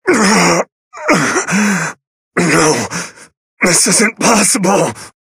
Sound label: Death; Fire Emblem Echoes SoV; Berkut